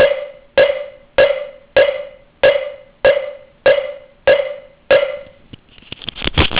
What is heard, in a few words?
mokugyo